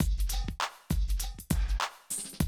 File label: drum loop, 100 BPM